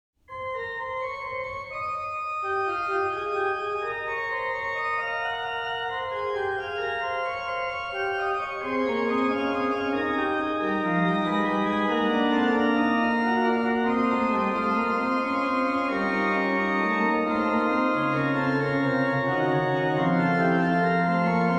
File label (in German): Kyrie Gott heiliger Geist, Bach Organ Works; Bach Organ Works Clavierbung III; BWV 674